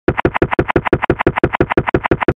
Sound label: steps; mario64stairs